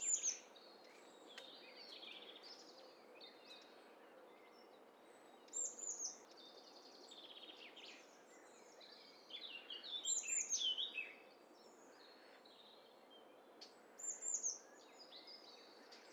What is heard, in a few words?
STEREO BIRD COCKTAIL PARTY